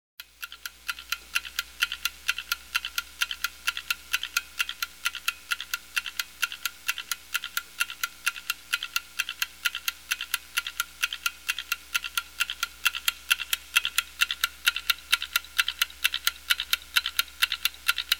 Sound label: Small clock ticking; SOUND EFFECTS1